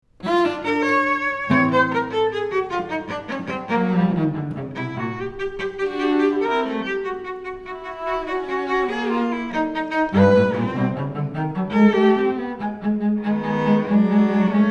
inventions for two cellos ii vivace

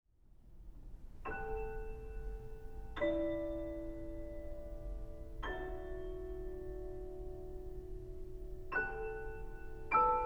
four pieces for solo piano

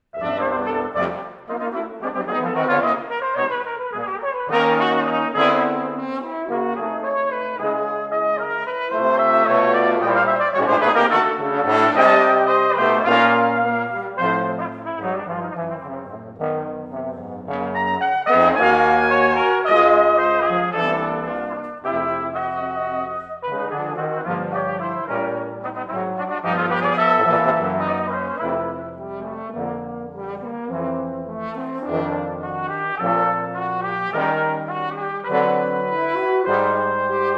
jazz style

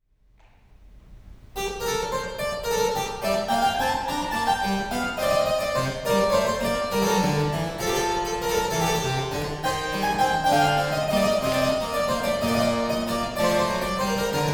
gigue; pieces de clavecin

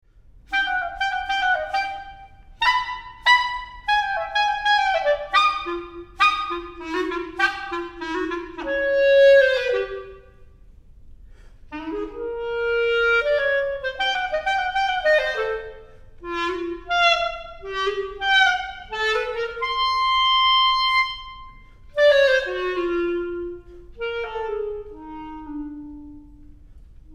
solo clarinet; 3 pieces for solo clarinet

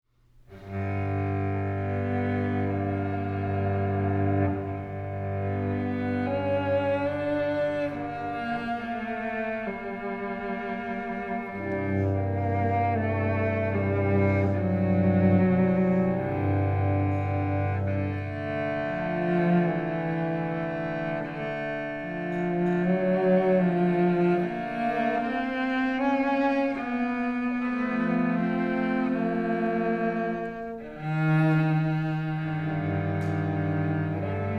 five duos for two cellos adagio

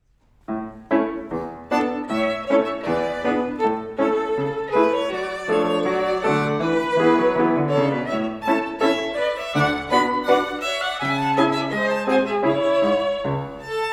gavotte

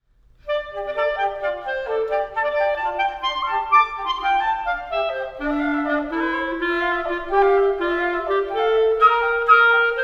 duos for flute and clarinet, allegro resoluto

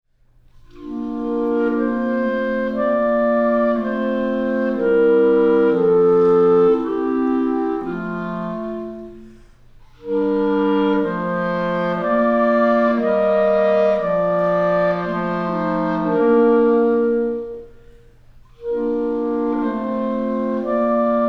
chorale; scenes from childhood

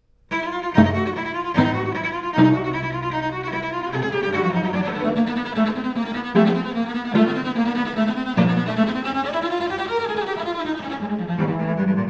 inventions for two cellos vi vivace

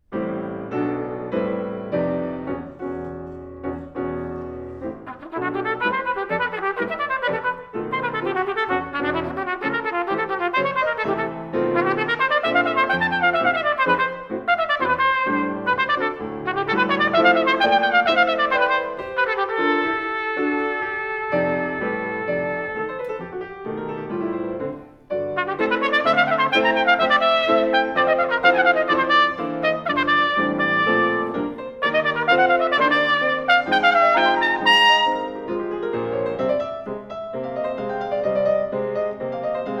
trumpet and piano; suite for trumpet and piano

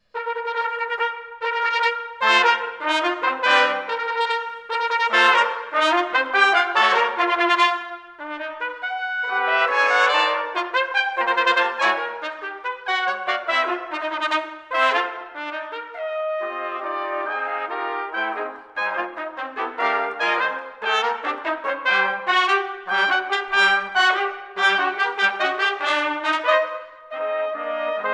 fanfare

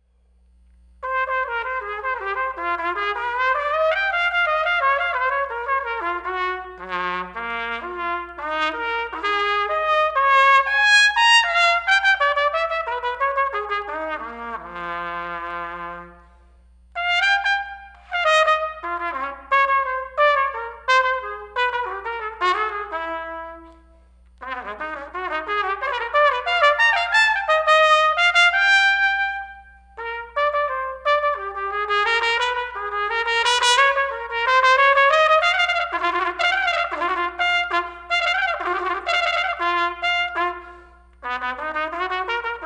trumpet

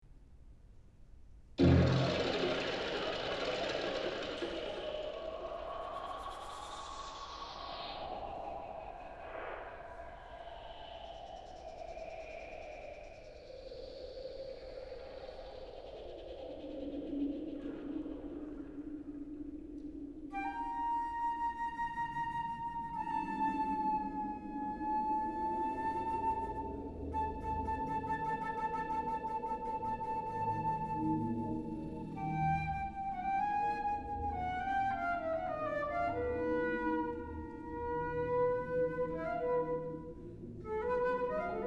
rain dance for flute magnetic tape

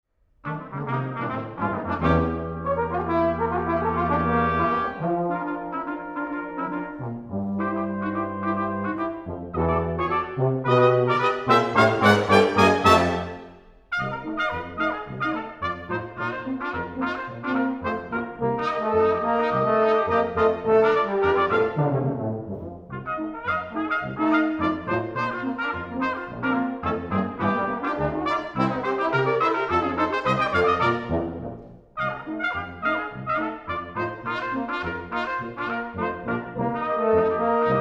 ragtime

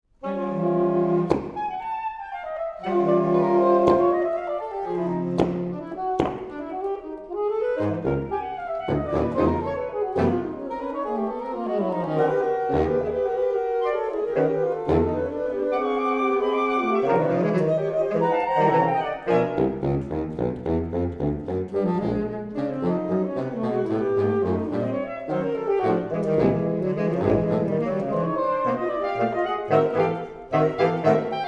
octet for saxophones iii presto

